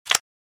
Playing cards flipping a single card from a deck poker blackjack